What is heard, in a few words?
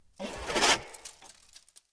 Fawn Idle Chatter; Encounters; Animal Rescue